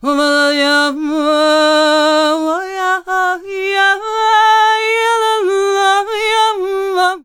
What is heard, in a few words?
MEH1 VOX, Balkanish Traditions